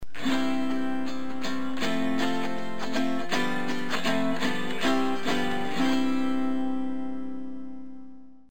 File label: autochord